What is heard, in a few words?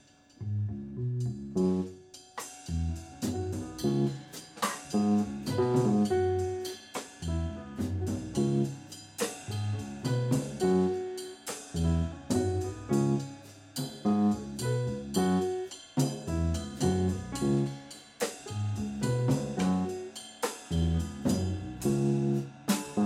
jazz Groove